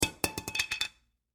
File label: Foley Lid Set Down On Counter Plastic; Set Downs; Objects